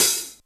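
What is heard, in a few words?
open hi-hat, Hip Hop DRUM; InVision Interactive; 40 Oz Phat Slammin Hip Hop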